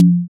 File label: tom mid, afro drill percussion